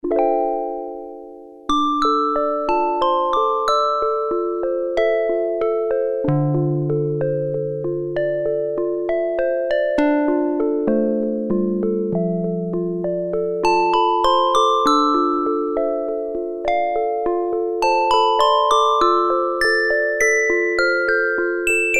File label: Bells 2